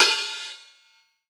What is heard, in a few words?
Acousti Hat Hit, hihat; ultimate hihat; Hits